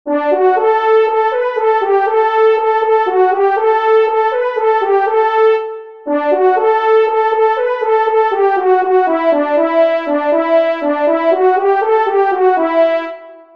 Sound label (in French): Terre du renard Solo; Fanfares imposables en Concours